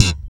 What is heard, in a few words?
POP F, Bass; Spectrasonics - Bass Legends CD 1; ALL THUMBS